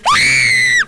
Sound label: scream; chatsounds; koro